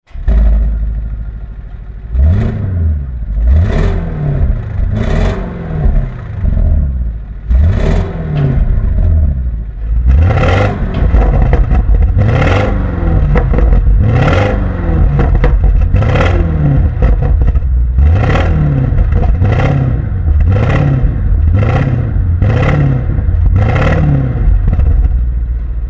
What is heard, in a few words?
driving scene; TurboS E-Hybr; TECHART; rev